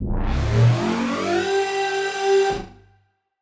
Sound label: Siren Horn Type Hits Ramp Down G; ProfMash; future rave